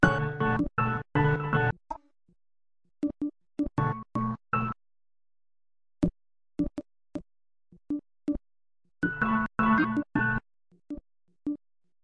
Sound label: 080 stutter piano bits; KEYS ORGAN PIANO LOOPS